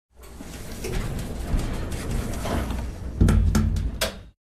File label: elevator close; Golden Crayon Sounds